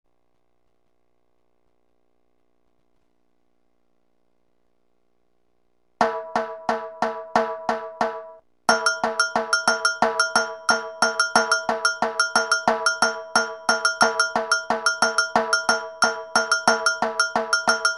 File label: Rhythm